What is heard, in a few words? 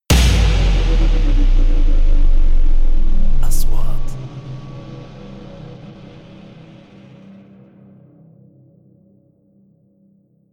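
3D SFX Trailer; مؤثرات تشويقية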